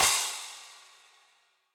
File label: Crash FX17o14 Classic Muffle, Cymbal; Drums; Drum Hits; Standard Hits; Session Drums Studio